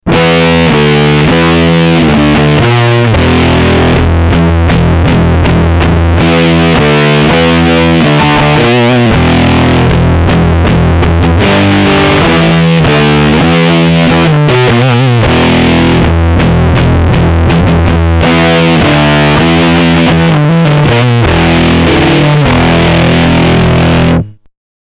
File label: Dirty Fuzz